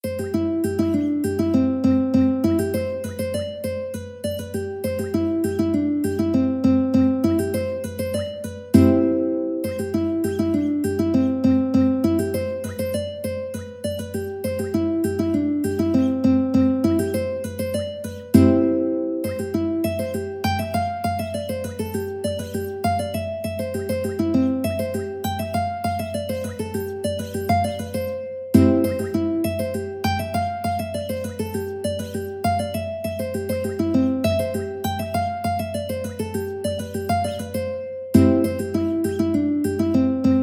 Petronella uke

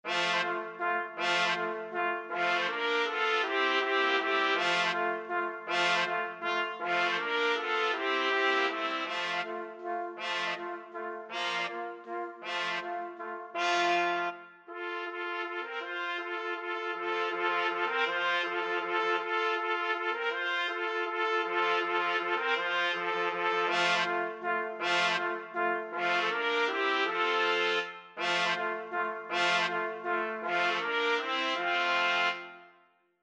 autumn, trumpet quartet

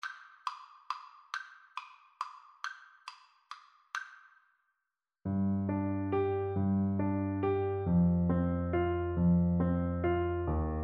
greensleeves, Tuba

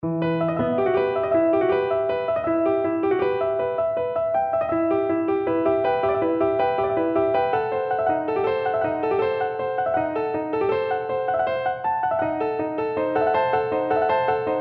rappel oiseaux, piano

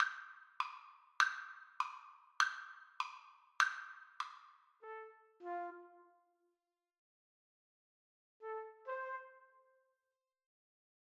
trumpet duet

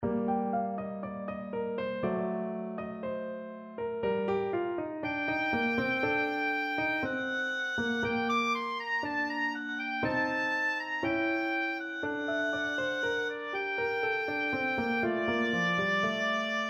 bach, oboe

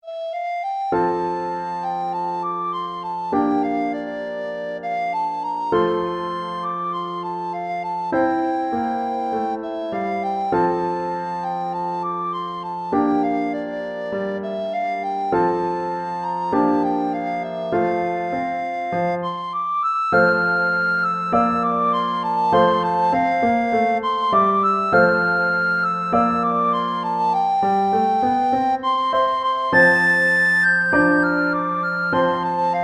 danny boy, recorder

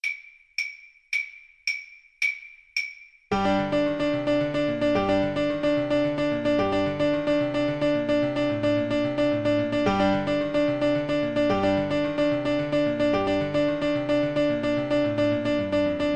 albeniz Asturias, cello

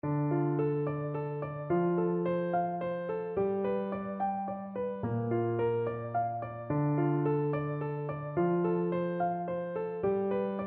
house of the rising sun, Alto Recorder

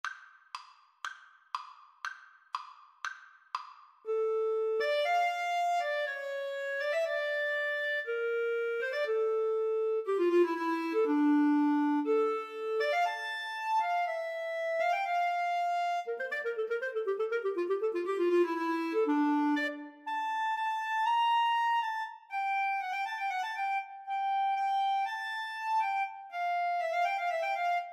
Clarinet Violin Duet

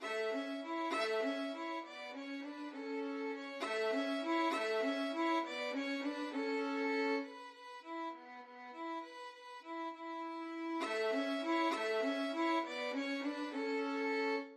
moaner violin duet

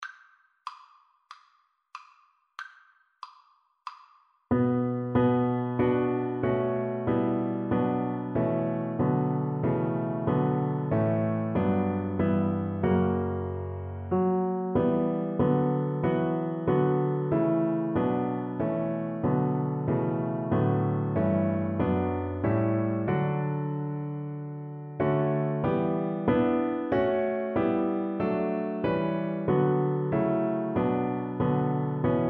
DB, Double Bass

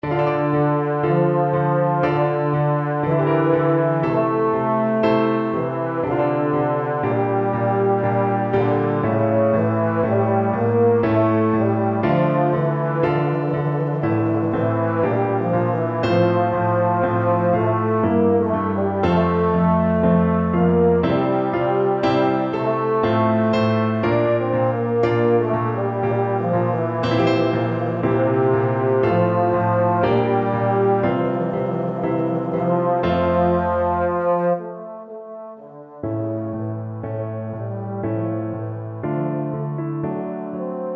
EUPH, euphonium

